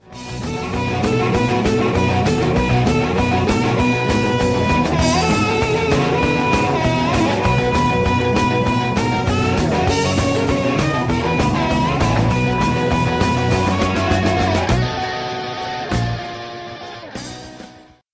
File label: low end break; Doghouse